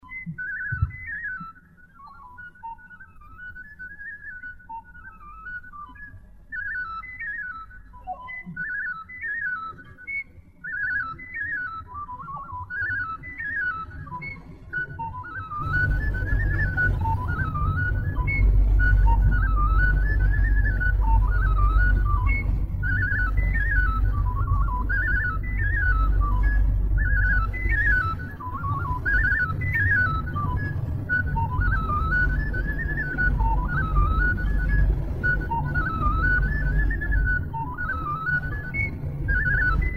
Batuta la fluier, jocuri si cantece batranesti; Vaslui

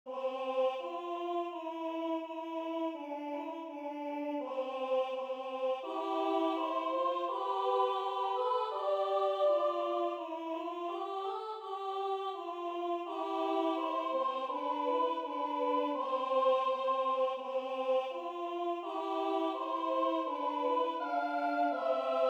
Coborat a coborat, Ardeal, colinde

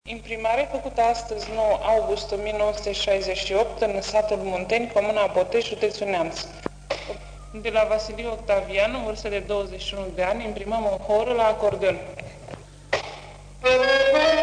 Hora la acordeon; jocuri si cantece batranesti; Vaslui

